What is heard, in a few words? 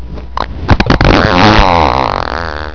Bigbang, farts